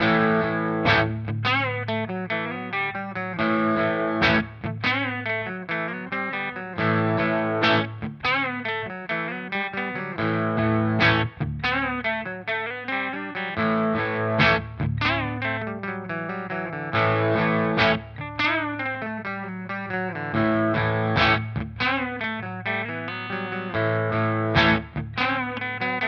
Epiphone Prophecy Les Paul Clean Bridge Single Coil Vintage